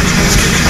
move, flying saw; skibidi toilet episode65 part2

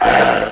wolf pre attack; The Monster Plane; kolmods preatt

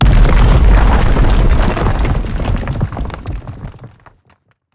rock attack; skibidi toilet emperor

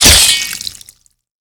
sword hit; The Monster Plane; kolmods melee

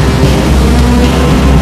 speaker spider long; The Monster Plane; cameramen1

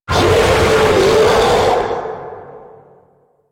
roar dolphin; zoochosis; lazynap; dolphin deepsea monster

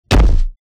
ground punch; zoochosis; lazynap; dolphin deepsea monster; clown

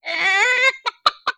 chicken; The Monster Plane; kolmods preatt

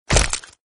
attack hit; sprunki simon pack

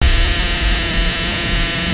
jumpscare; The Monster Plane; kolmods jumpscare

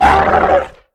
pain, procoptodon; zoochosis pack

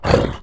gnarr melee mouth; The Monster Plane; kolmods preatt

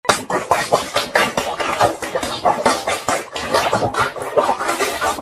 phase 1; Garry's Mod; sprunki bailey zombie

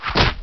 walking running; blizzard spawn

